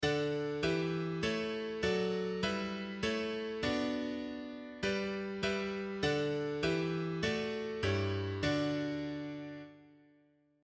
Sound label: Cadence